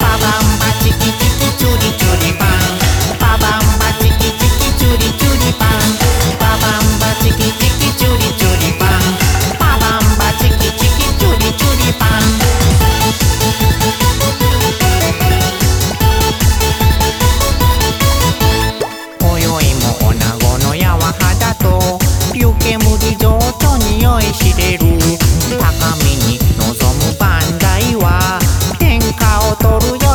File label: Loop; Daito Giken Koushiki Pachi-Slot Simulator Shin Yoshimune; PS2